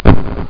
Heartbreak; Interactive Cartoon Strip!

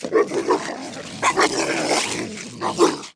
DOG FIT; PC Gamer IT CD 40; zones; abandoned

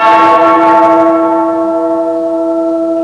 bell; Sound Library 2000; Wayzata Technology

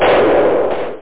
clap

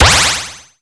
noisy application; Earth and Beyond; EA Games

